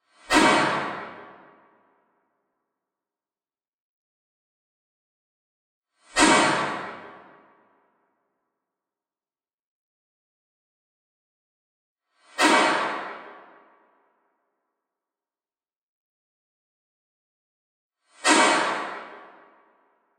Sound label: Simulated Limelight Ignition Blast